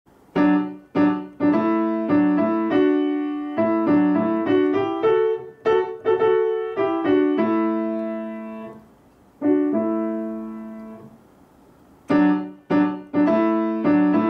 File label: the hunting horns and the echo